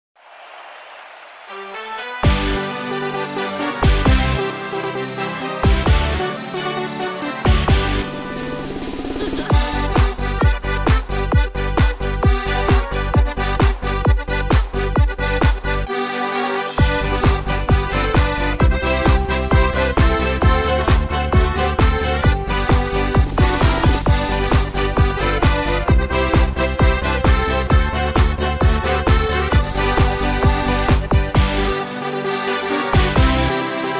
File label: party disco